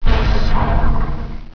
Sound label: Kvir Die; Virus: The Game